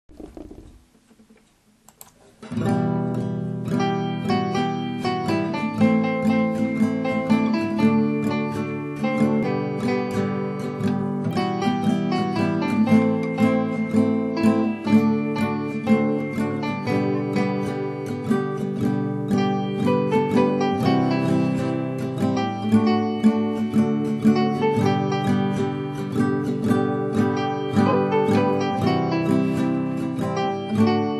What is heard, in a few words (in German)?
Sein Name Weihnachtslied aus Agypten Computer und Gitarre